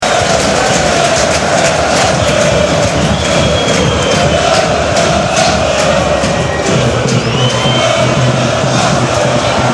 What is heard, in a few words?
Lalalaalalala; Aachen